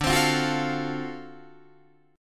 D 7th Sharp 9th D down Guitar Open D

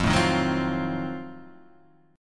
F 7th Flat 9th, Guitar Open D

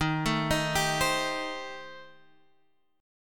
D sharp 6th Flat 5th D sharp; D#6b5; arpeggiated; 11,12,13,12,13,x; chord shape

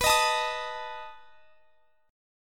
B 7th B down; strummed

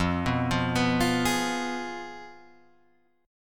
F Diminished Guitar Open G

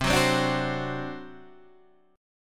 C Suspended 2nd Flat 5th C down Guitar Standard; Csus2b5 strummed

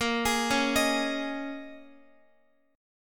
A sharp Minor 11th, Guitar; x,x,10,13,13,11; arpeggiated; JGuitar

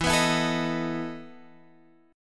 E Major 7th Suspended 4th E down

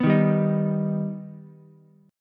D sharp Augmented D sharp Ukulele; D#+ strummed; 4,3,3,6; JGuitar

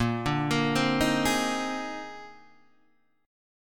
Bb 11th, Guitar, Open D

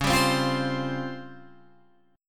C sharp Major 7th Flat 5th Guitar Open G; down; chord shape

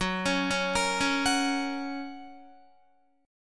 Gb Major, Guitar; Gb arpeggiated; JGuitar

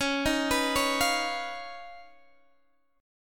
Eb 7th Suspended 2nd Sharp 5th, Db

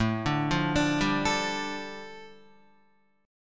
A 7th Suspended 4th Sharp 5th, A, down, Guitar; A7sus4#5 arpeggiated

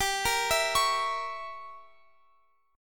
G Diminished 7th, Guitar, Open F; Gdim7 arpeggiated; x,x,19,17,19,20

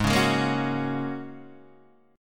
Ab 9th Suspended 4th Ab down Guitar Open D

